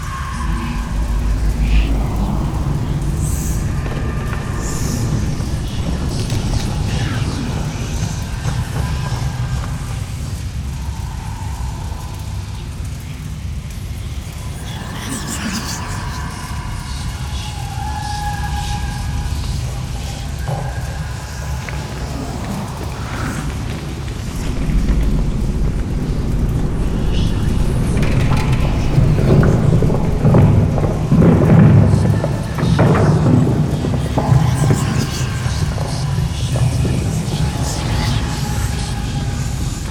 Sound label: Ambient; dante s inferno; x360